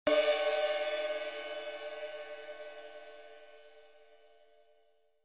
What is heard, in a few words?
20in Custom Sizzle Ride, cymbal sound